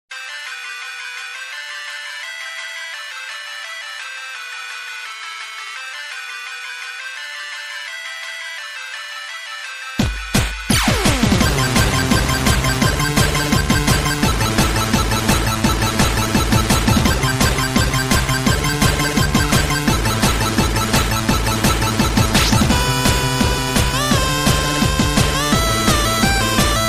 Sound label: Blipp blipp